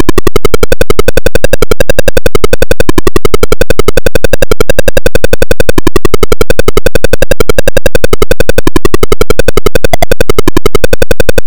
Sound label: in-game tune; manicminer